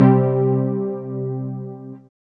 SYN, Analog

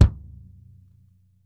SHORT ROOM, KICK DRUM; Sampleheads; New York City Drumworks VOL-1; SP KICK DRUM

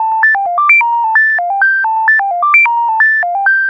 NRG Arp, trance

